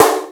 Snares Electro

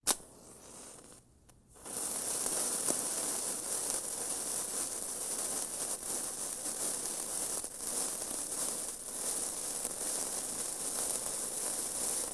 fuse; Crash Bang Boom

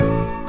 quarping, Piano; Notes

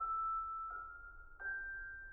keys, Loops, Sad

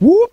whoop male, voice; ooh ahh; ho hoo woo

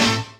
pop that stab

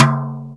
Toms, Real

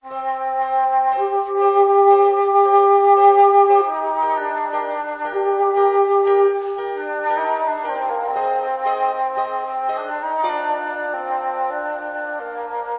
flute, Sad

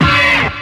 explosive

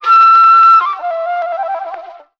flutey twiddle

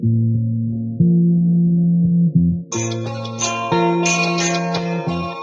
guitar up and down, Loops

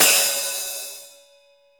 Cymbals

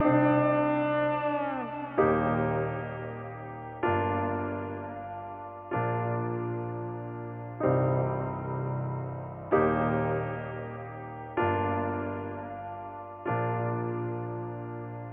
piano whale call, Sad; Loops

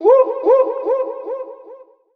woo

